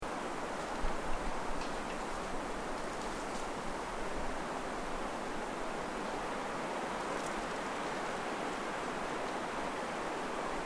Ambience Sannohe Forest Winter Snow Falling From Branches, Winter in Japan; Forests and Jungles